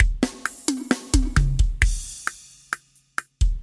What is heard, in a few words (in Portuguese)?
VIRADA